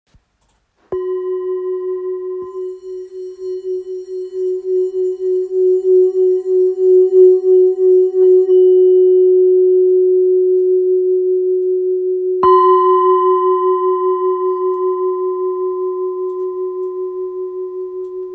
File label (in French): Bol ancien fa diese